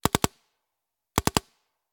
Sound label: Paintball Gun; Sound Ideas; Guns